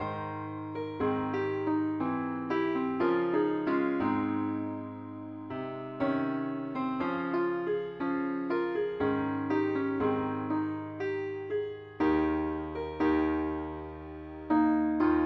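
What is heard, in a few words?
keyboard only key A flat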